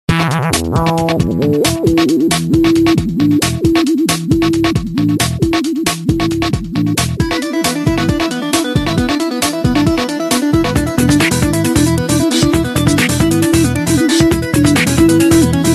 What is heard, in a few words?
nokia tension ringtone